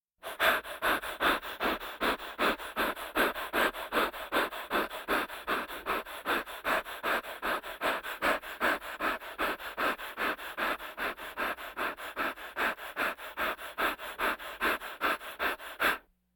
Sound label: Vocal effects breathing Moaning Baby